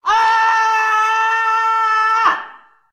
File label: aaah